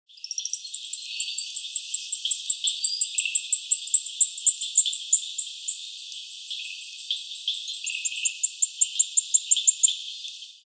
Boophis Vittatus; amphibia

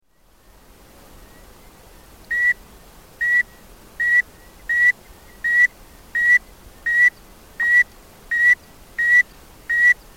Phrynopus Laplacai; amphibia

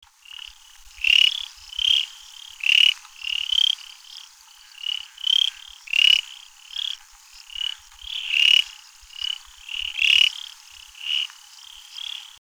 Heterixalus Rutenbergi; amphibia

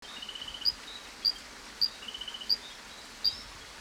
Centrolene lynchi; amphibia

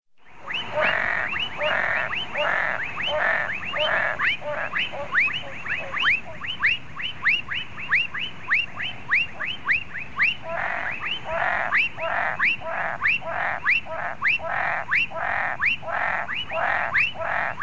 Hyla Lanciformis; amphibia

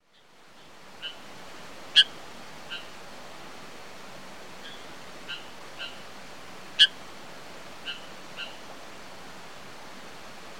Eleutherodactylus Samaipatae; amphibia

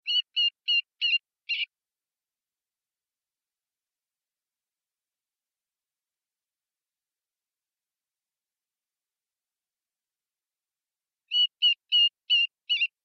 Rhinoderma rufum; amphibia

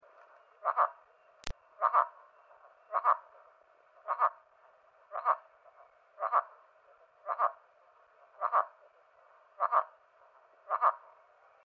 Caudiverbera caudiverbera; amphibia

